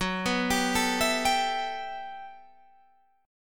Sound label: G Major 13th